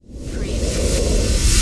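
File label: Production Element Reverse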